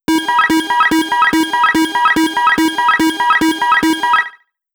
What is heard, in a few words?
movement slot